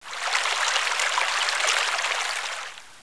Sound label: RIVER; Caesar III